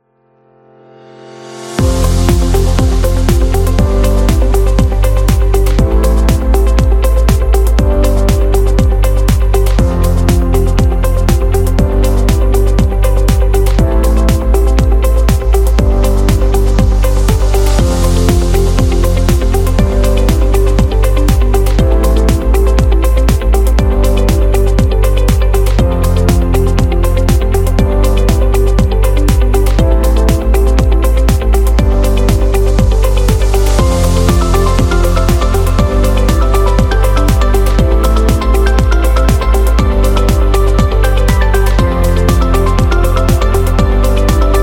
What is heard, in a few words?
Uplifting Corporate